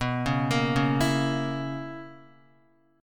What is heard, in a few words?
B Major 7th Suspended 2nd Suspended 4th B down Guitar; BM7sus2sus4; arpeggiated; JGuitar